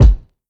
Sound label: kick lofi, Hip-Hop; Drum One Shots; SD CAICOS Hip-Hop Sample Pack; Slate